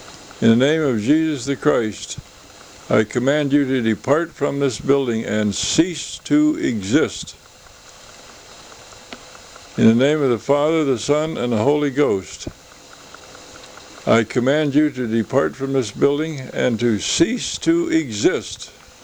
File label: Chant